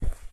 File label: footsteps carpet Walk